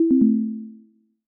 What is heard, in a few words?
Wrong